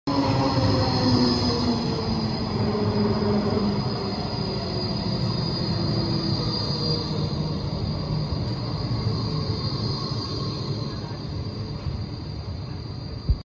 tram crossing

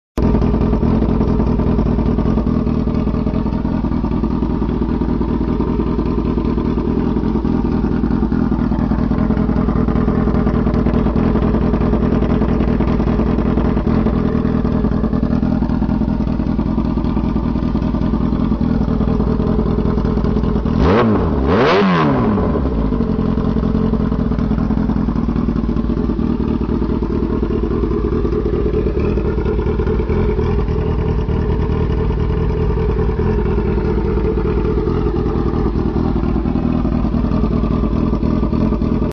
bullet exhaust

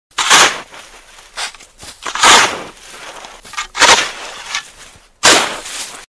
Shoveling Snow

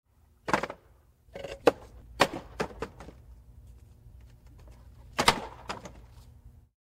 Wooden pallet

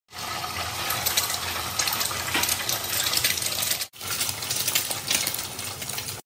fried egg

